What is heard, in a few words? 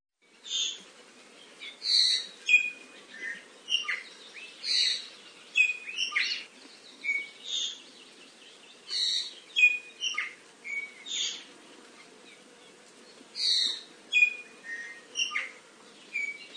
kauai iiwi